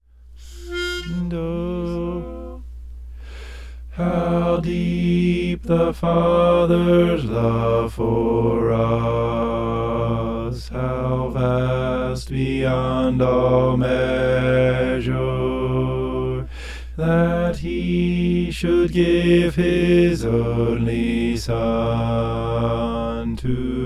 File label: bass; how deep